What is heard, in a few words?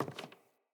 Sandals Walk Wood, footsteps; Player